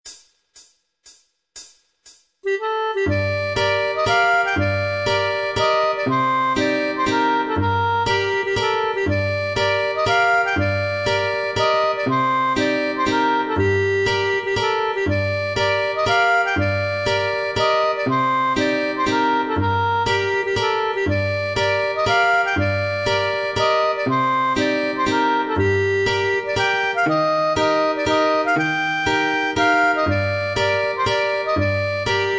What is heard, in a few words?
Mazurka